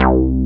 BASS, SYN BS

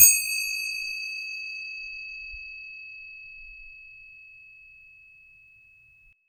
Indian Bell Hit Loop, Ethnic Instruments

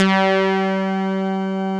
POLY G3, SYNTHESIZER; GLUNGE

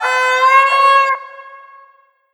VIOLIN FX; USB Soundscan vol.60; Asian Traditions; AKAI; VIOLON FX

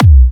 Kicks B; markus hakala

